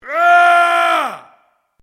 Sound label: MALE ROAR AGGRESSIVE SHORT; male vocalisations; Yelling; Voice